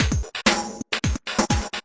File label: breakstep, drum loops and breaks; 121 to 130 bpm